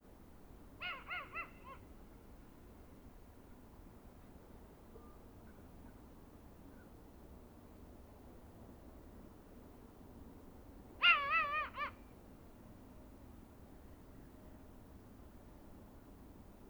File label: Eurasian Eagle Owl Slurred devils Cackles Of Female